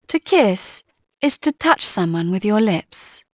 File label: kiss; Dorling Kindersley My First Incredible Amazing Dictionary